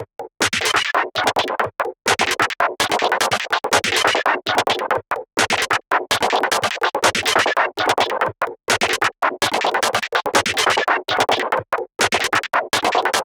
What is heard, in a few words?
Loop, PsyTrance